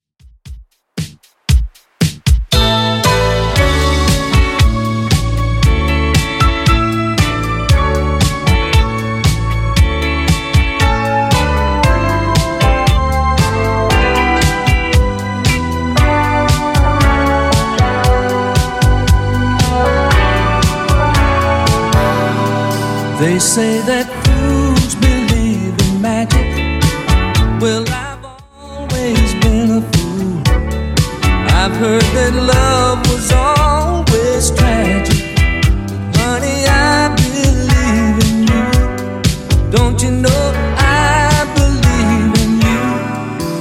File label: 80s Redrum 116 bpm; remixplanet